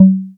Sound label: short tom f sharp key; tom drum samples; one shots